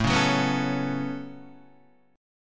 A 6th Add 9th A down Guitar Open D

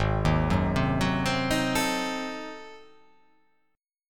G sharp 7th Flat 9th G sharp down, chord

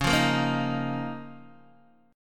Db 6th Add 9th, Guitar, Open D

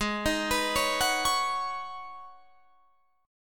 G sharp Minor 11th G sharp Guitar Drop D, chord

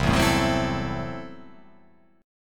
C 6th Flat 5th, C, down

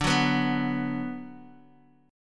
D 6th D down Guitar Open D, chord

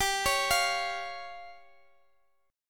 Db Major Flat 5th G

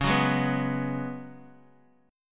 C sharp 7th Suspended 4th C sharp, Guitar DADGAD

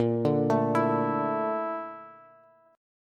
A sharp Major Sharp 11th, Bass, Bass Standard; A#M#11; arpeggiated; JGuitar

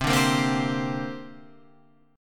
C 7th Suspended 2nd

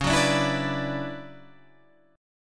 D Major 13th Db, chord

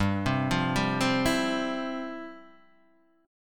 F Major 7th Suspended 2nd

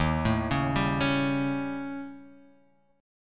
Eb 7th Flat 5th, Eb; x,6,7,6,7,8,x,x; Eb7b5 arpeggiated; JGuitar

